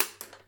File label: record off; SCP-1576